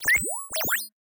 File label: Robot Voice High Register Cute R2D2 Crazy